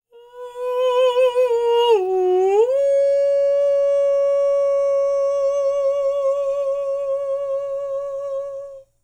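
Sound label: falsetto, Vocal Planet Jazz FX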